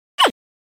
ungh

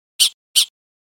crow

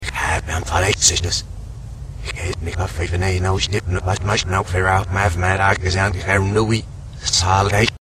vampire; reverse

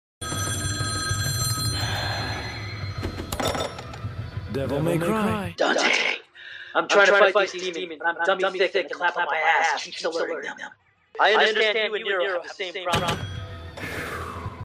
devils cry; cave

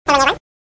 penguin yay

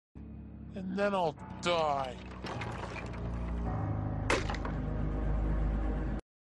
die

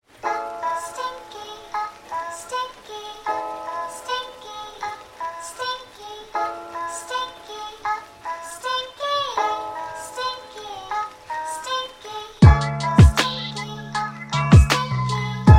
lofi uh oh

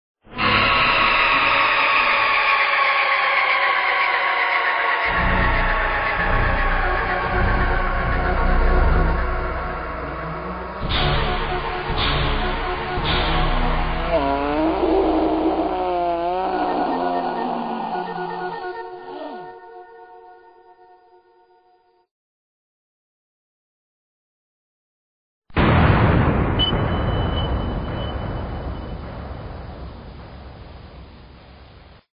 game over; vapesquared

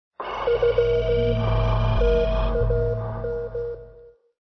scan

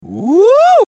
woo; reverse

